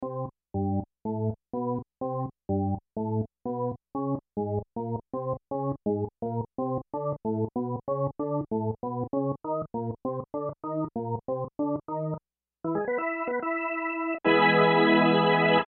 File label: Music Organ Charge, Music Organ Charge Ascend; Chromatic; Accelerate; Chord Stab; Keyboards